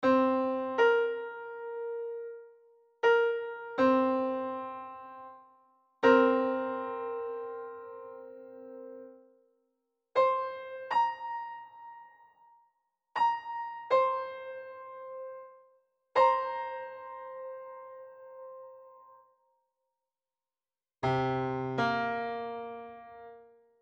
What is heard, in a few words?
minor 7th